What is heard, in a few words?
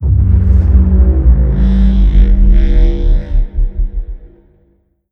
sfx star eater weapon windup starting; Stellaris; nemesis